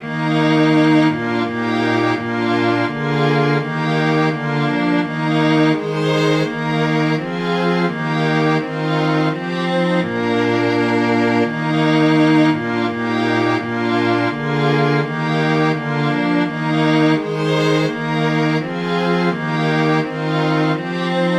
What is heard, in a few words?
deutsche nationalhymne string quartet; Deutsche Nationalhymne / German Anthem